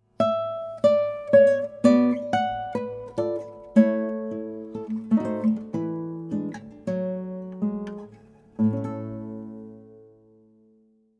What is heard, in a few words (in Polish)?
gitara klasyczna